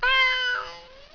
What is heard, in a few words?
yelp, animal, pain